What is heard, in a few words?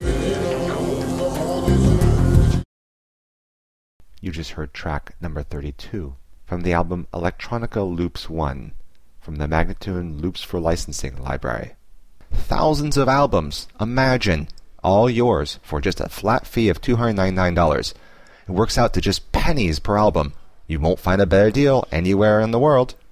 090 F spoken; Electronica Loops 1